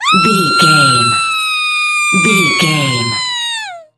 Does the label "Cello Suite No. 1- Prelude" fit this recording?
No